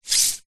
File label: cd disc slide on wood surface